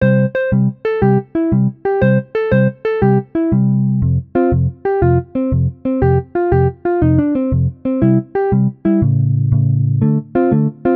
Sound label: Guitar blues